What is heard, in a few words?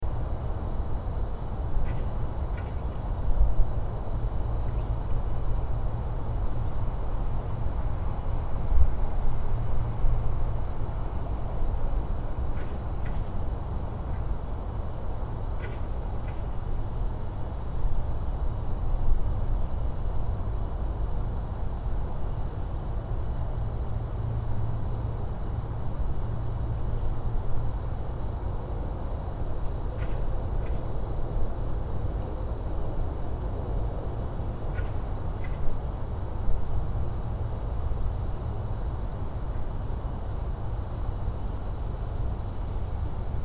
dawn